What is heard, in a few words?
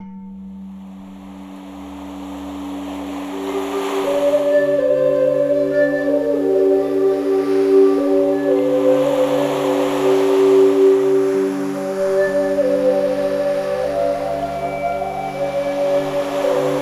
285 Hz